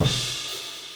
OH

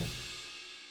HiHat

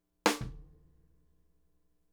OH

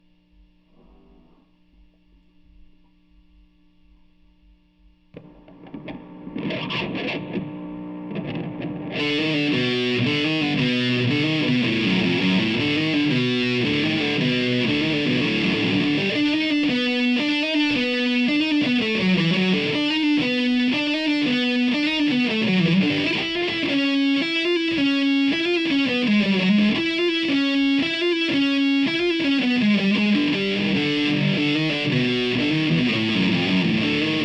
GTR

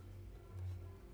violin

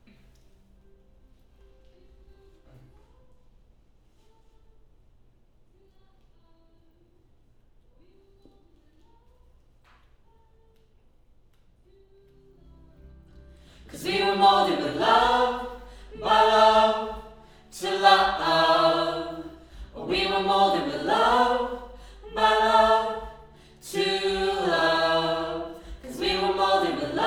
Choir Mid

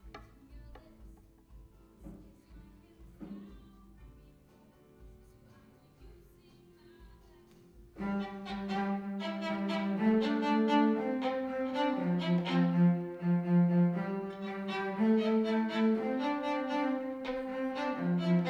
Violin

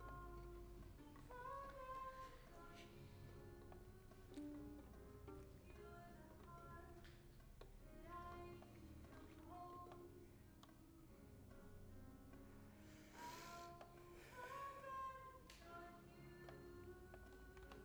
violin

